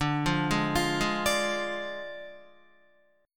D Diminished D, Guitar; arpeggiated

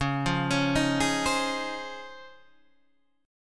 C sharp Major 9th, Guitar, Half Step Down; C#M9

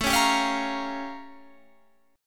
A Augmented 7th Flat 9th A; A+7b9 strummed; 1,3,sharp5,b7,b9

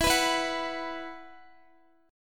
E Suspended 2nd, Guitar; Esus2; strummed; x,x,14,16,16,13

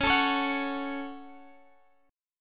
C sharp Suspended 4th Sharp 5th; C#sus4#5; strummed; x,16,19,18,19,x

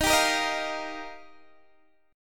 Eb Major Add 9th, Guitar DADGAD

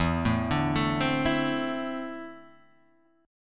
E 7th Suspended 4th Sharp 5th Guitar Open D

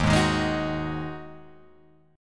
Eb Minor Double Flat 5th Eb Guitar Open G

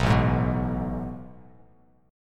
A Major 7th Suspended 2nd, Bass Standard; AM7sus2 strummed; 7,0,6,4; JGuitar

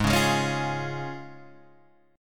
C sharp Major Ab; C#/Ab; strummed; JGuitar

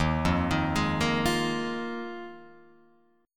Eb Suspended 2nd Suspended 4th, Guitar, Open F; Ebsus2sus4 arpeggiated; JGuitar

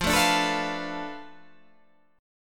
F 6th Flat 5th F down, chord

